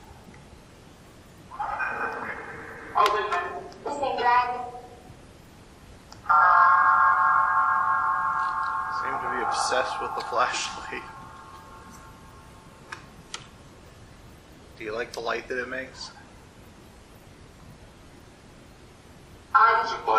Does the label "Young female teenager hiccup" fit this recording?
No